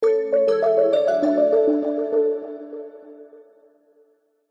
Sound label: multimedia alert dreamy soft warm drippy delayed bells positive